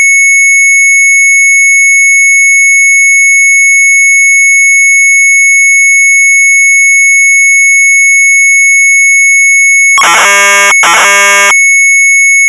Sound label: MZ800 Tape; GRASIC; retromelsarchive; sharp mz800